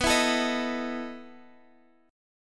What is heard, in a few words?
E Major 7th Suspended 4th, B